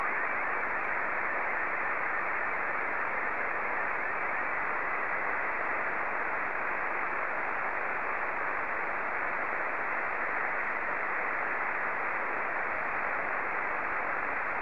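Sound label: SSTV, Scottie 2; JA3OEN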